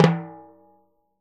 TIMBHI, Synclavier Percussion; ILIO; Synclavier Percussion 2; World & Orchestral

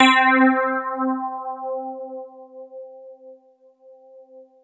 SY99 EP; KEYS; Alpine Volksmusik

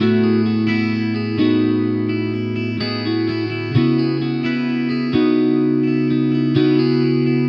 Electric Pop Guitar 128BPM C#min; Vengeance - Essential Clubsounds Vol.4

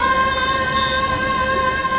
ATMO SYNTH

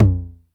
909 HI TOM, TR-909; Crypton - DrumBase5000

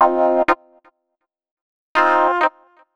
125 Clavy C, Keys, Nu Jazz House; PowerFX - Nu Jazz House

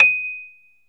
Rhodes 73 Slow; 3D Rhodes

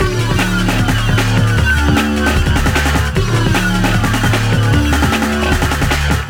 Hardcore Breakbeat, 152 Dmin; Big Fish Audio; Hardcore Breakbeat Action; Gone Insane

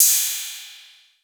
808, CYMBAL; Wizoo - Platinum 24 Electronic

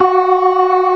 ORGAN, C3 NO PERC; Keith Emerson Signature Series

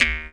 EF JAW, Primitive Instruments; Big Fish Audio - Didgeridoo and Other Primitive Instruments; JAW SIMPLE

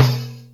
Tamb HH; Yamaha DTXpress; Percussion

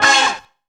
SERIOUS STAB; Zero-G; Funky Elements

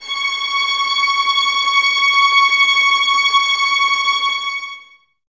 VS C#6 Tremolo, 11 Violins; Miroslav Vitous

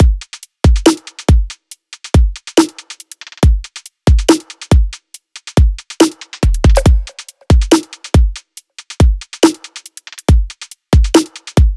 140 drum loops; Sundial; M8; Electronisounds

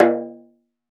Dof Rim, Percussion; Roland BK-5

